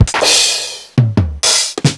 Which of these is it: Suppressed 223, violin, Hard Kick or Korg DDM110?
Korg DDM110